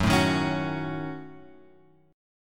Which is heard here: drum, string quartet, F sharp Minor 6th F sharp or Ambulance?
F sharp Minor 6th F sharp